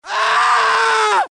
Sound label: DIE, flesh n scream; hl2dm; oppressive territory